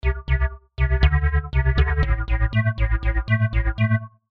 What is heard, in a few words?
Synthesizer parameters LFO to volume, tremolo; LFO mapping; I’ve created a tremolo